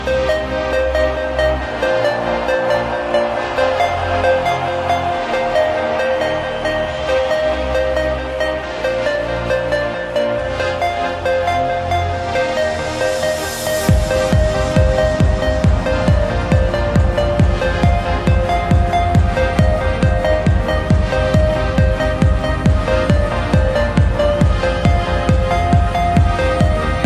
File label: Trance Techno Dance